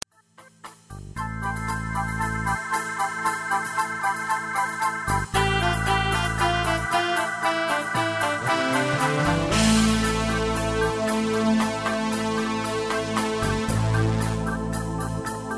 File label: Lead